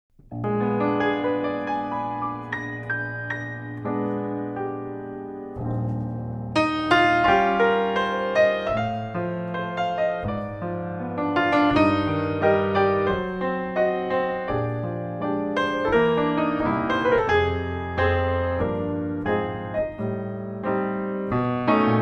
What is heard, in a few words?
Pianist